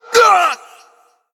Male Undead Death

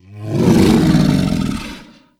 Silverback Patriarch Death

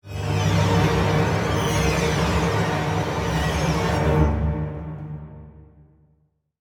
Play Stinger

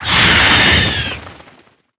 Attack; Pyros

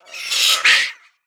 Jeweled Macaw Attack

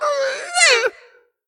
Bronze Whelp Attack

